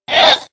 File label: Bomb Collision